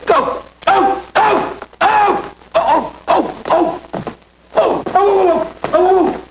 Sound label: frog; Three Stooges